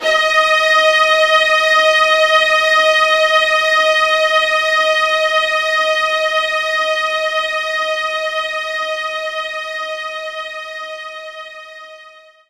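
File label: String Loop D sharp 4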